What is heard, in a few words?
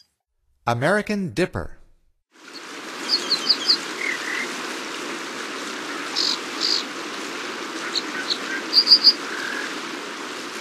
American Dipper, Songs of Birds of North America; Stokes